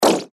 Beep Tone Signal Multimedia; Web Interactive; Blastwave; Technology

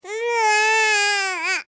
Human Baby Cry Single; Babies (Sound Effects:Voice)

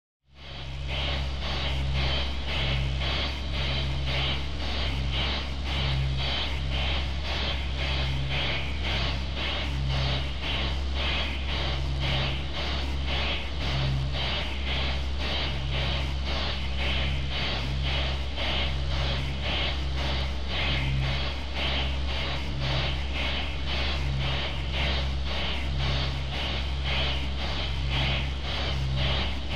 Drone Static Distortion; Drone Static Distortion Chopper; Stutter Rhytmic Metallic Clanking Production Element Imaging Element Texture Bed Atmosphere Synth

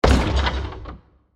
Heavy Impact With Different Elements; Burst Sound Effects; Bursts (Sound Effects:Impacts)

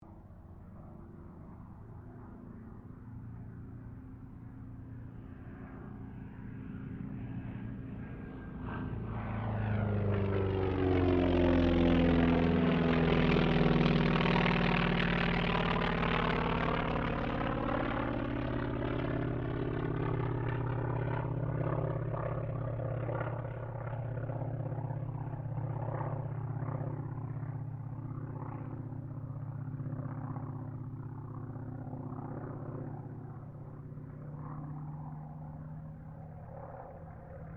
AIRCRAFT T-28D TROJAN PASS

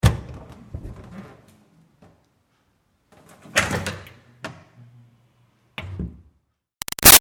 Door Wood Push Bathroom O-C; Open - Close; Miscellaneous Doors; Big Room Sound

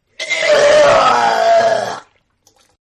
Vomit; Vomiting; Human; Partners In Rhyme

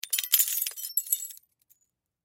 Crash Glass Debris Impact; Crash Glass Debris Drop; Materials; Debris (Sound Effects:Materials); Blastwave

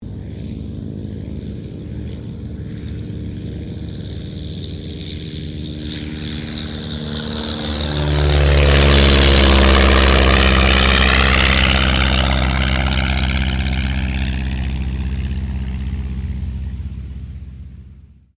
AIRCRAFT DH82A DEHAVILAND TIGERMOTH TAKEOFF; Mixed selection of Tigermoths from the 1940s; Airplanes

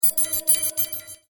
Illusion Spell; Magic Sound Effects; fantasy sound effect; Andrew Fleming

